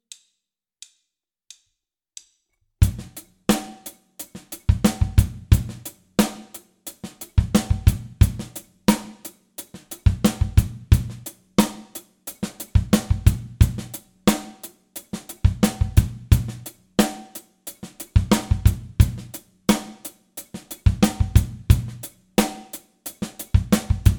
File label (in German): Beispielgroove; Bum Bum Tschack 1